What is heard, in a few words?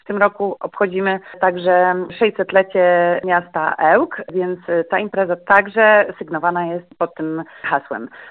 techno; wariacje z nauka w parku naukowo technologicznym